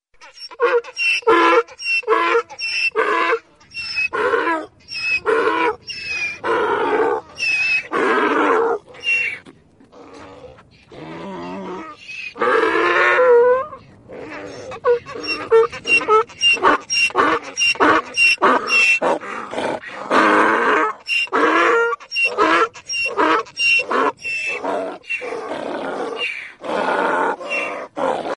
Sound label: donkey